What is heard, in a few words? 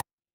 ui hover